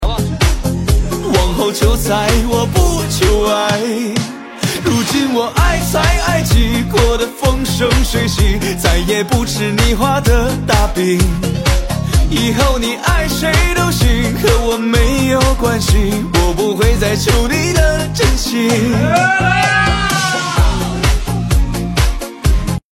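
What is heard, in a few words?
remix, Chinese, male voice; nhac chuong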